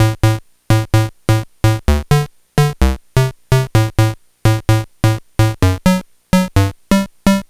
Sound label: Game Boy Bass; 8-Bit; Chiptune; Nintendo